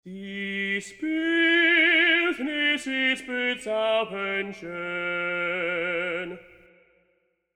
Tenor Phrase Intonation Korrektur, Voices Of Opera